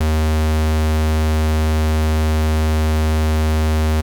square, 808, SubOsc

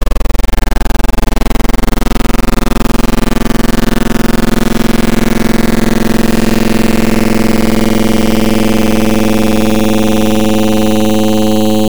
Lower Fi, FM Wavetables; Cymatics; FM Wavetables Vol 2; Serum

